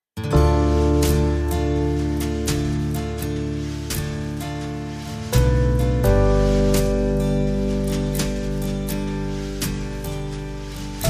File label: Monday Blues